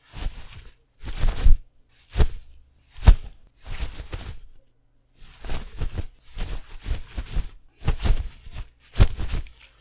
cloth sounds

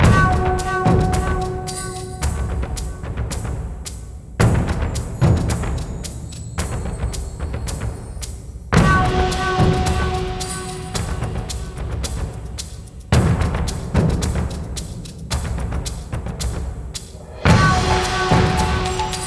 Light battle

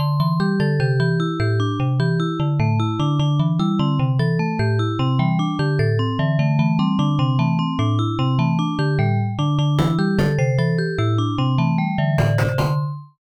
Highbeat

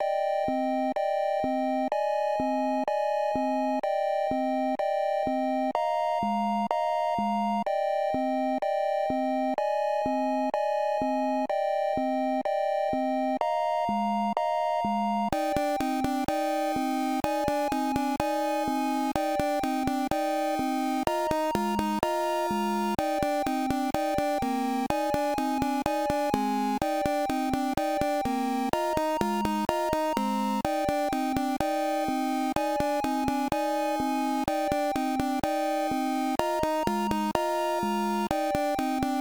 slow melancholic theme c64 style

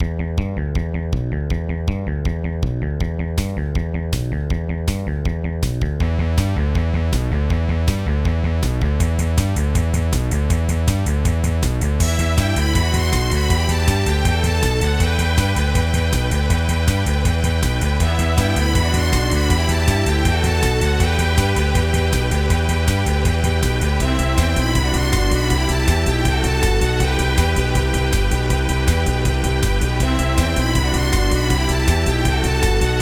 railgun rain

